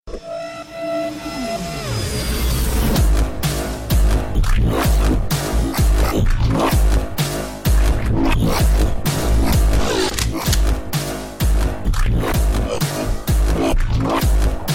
robot dub, electronic ringtones

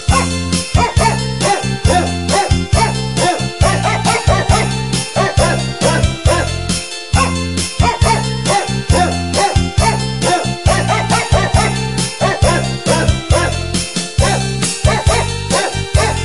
deck the halls pets

